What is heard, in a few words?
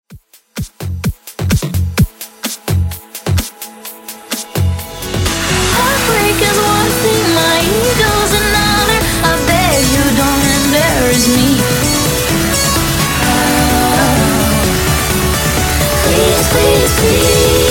edm breaker 128 bpm